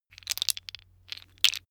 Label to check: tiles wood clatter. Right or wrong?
Right